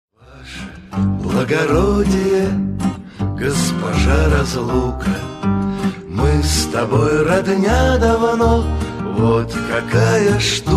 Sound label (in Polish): rosyjskie dzwonki